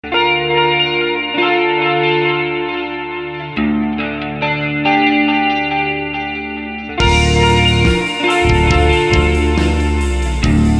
cinematic rock guitar 140

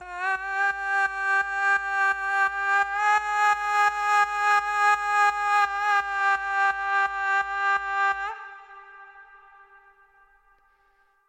ducking audio

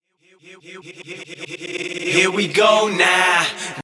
stutter

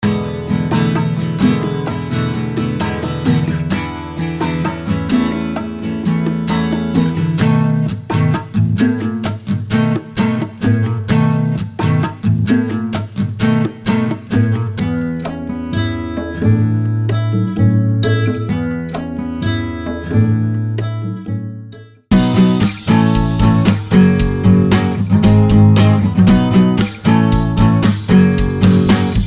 steel string

